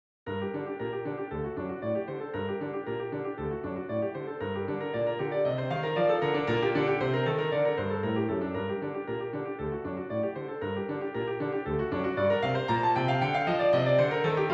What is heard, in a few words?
Moment Musical in g minor, fazioli